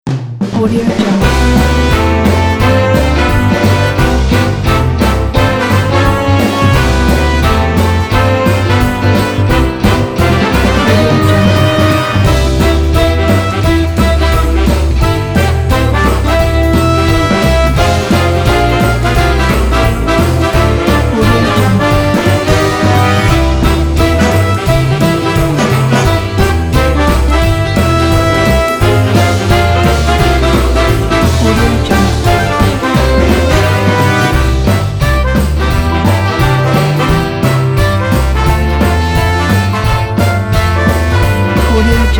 jingle bells big band style

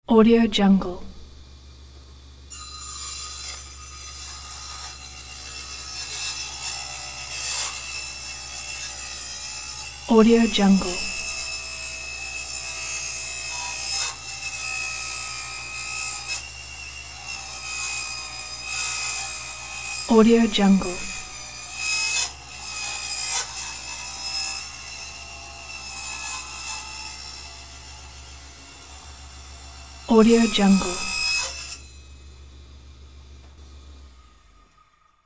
table saw; sound ideas

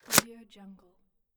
instant camera lens barrel; stormwaveaudio

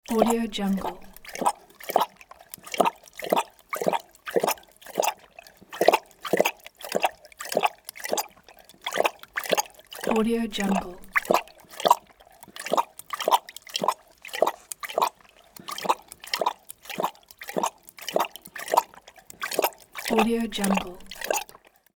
large animal drinking; sound ideas

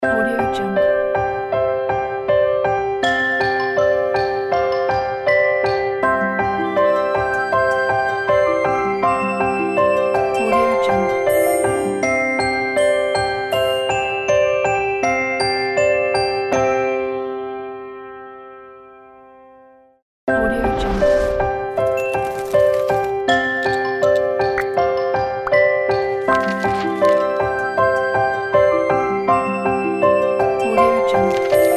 little love ident; pizzaeffect

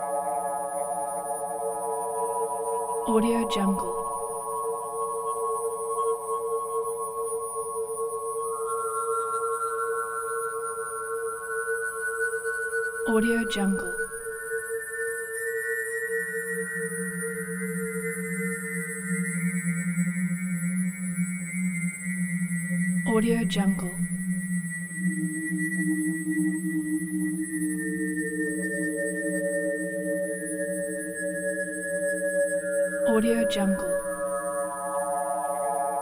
synth ambience soft musical tones ringing

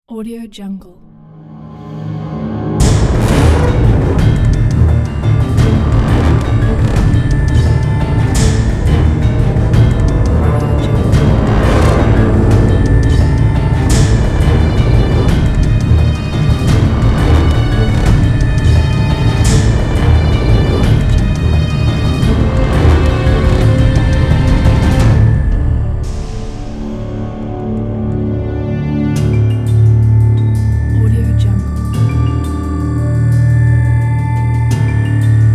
horror chase scene; nickdi

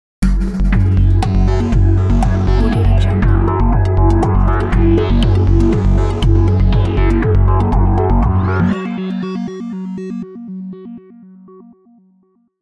information logo

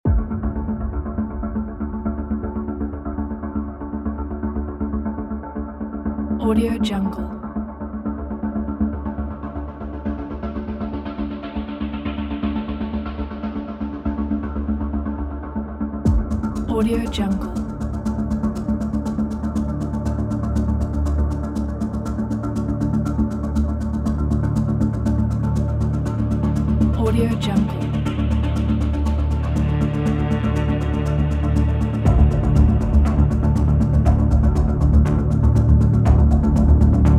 tension medicine

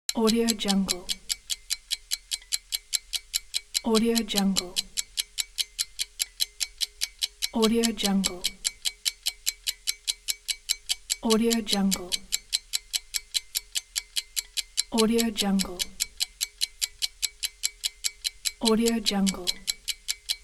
clock

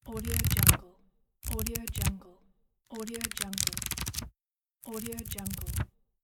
the book flipping

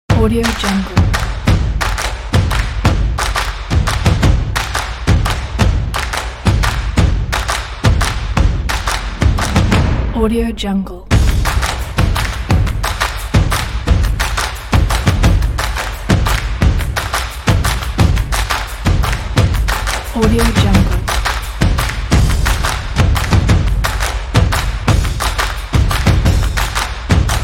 cinematic drums

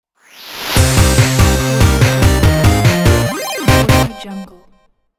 8bit logo

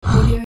low grunt; sound ideas

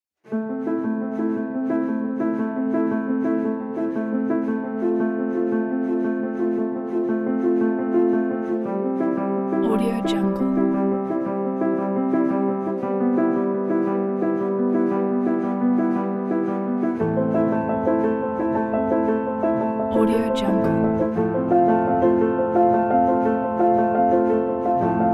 piano and strings inspiring; lindsjomusic

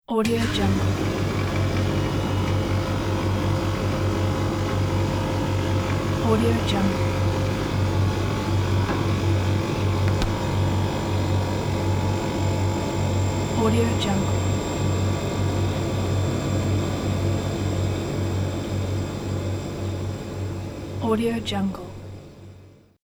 fridge hum

